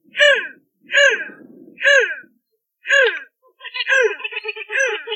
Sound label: grandule pin-tailed sandgrouse, birdsound